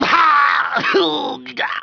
Henchman Death; Playable Tutorial Level; TR2

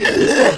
Attack; TR3; Willard

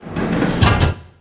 Jump Pad Down; Playable Tutorial Level; TR2

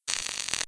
Elec Short; Playable Tutorial Level

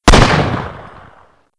Sniper Rifle; Playable Tutorial Level

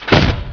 Vehicle Speedboat Hit; Playable Tutorial Level; TR2

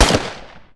Army Smg Fire; Playable Tutorial Level; TR3

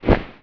Eagle Wing Flap; Playable Tutorial Level; TR2

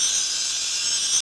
Portal Loop; Playable Tutorial Level

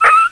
Wolf Death; Playable Tutorial Level; TR1

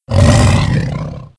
Lion Growl; Playable Tutorial Level

